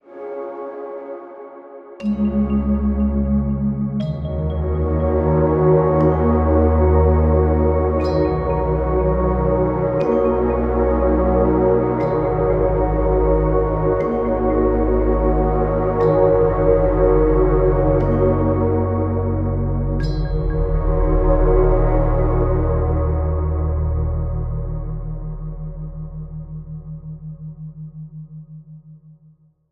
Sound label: Organ